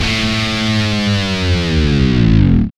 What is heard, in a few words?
GTR, E.Guitar FX; Roland LCDP02 Guitar and Bass